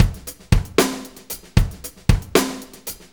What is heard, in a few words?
Jazz Rock, Drum Tools, Various Loops